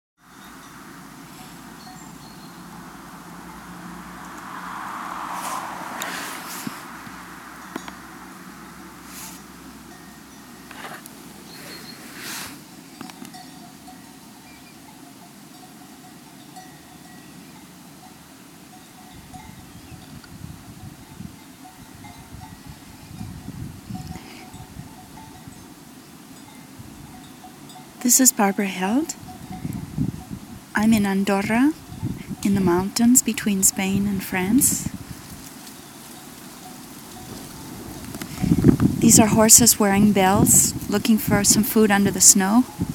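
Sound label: Noon, bells; Andora Mountains, Spain; Solstice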